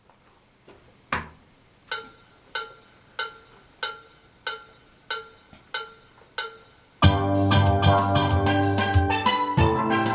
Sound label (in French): Basse